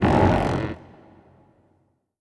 strider stretch; creatures; Half Life Alyx

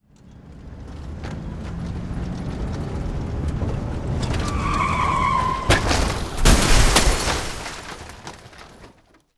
van crash

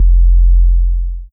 charge detonate rumble, mechanical units, thumper

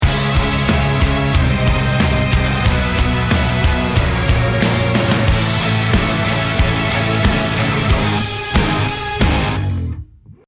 start action, music

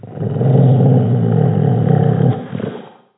dog growl behind wall

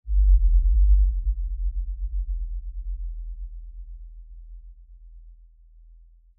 amb cavern excavation lfe; ambient; Half Life Alyx

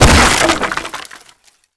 break wood box; physics; breaking

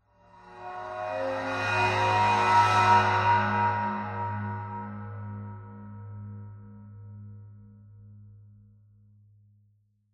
cymbal high, ambient; cymbals

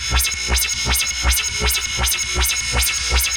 tripmine alarm; weapons; Half Life Alyx

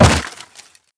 wood plank break

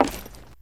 footsteps, wood; player

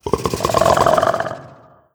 idle croak, barnacle; Half Life Alyx

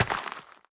step light, footsteps, player, dirt

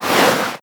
body slide, antlion; creatures

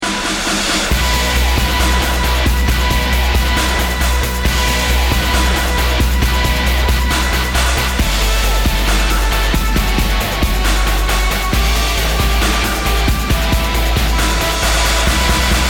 start action, music

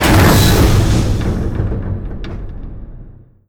start, waste pit bridge

